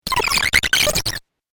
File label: Crazy Glitch 8Bit Style; Video Game